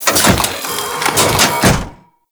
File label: Biped Pod Spawner Close; Warframe; Corpus